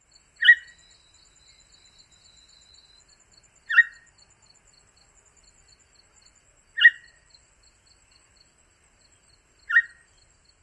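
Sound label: calls, long-billed thrasher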